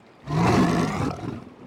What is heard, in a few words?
Animal Call Cat